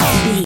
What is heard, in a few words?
sci-fi weapon blaster laser fun